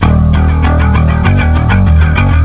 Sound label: loop